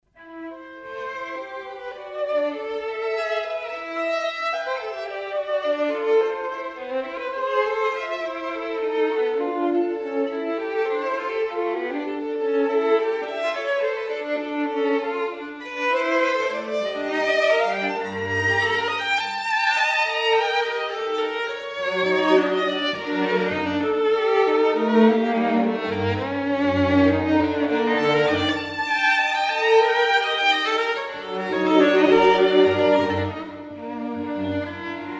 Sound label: String Quartet A Minor; Walton